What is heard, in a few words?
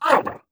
ballbot ow; player; Portal 2